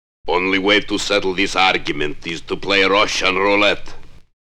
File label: fx and scratches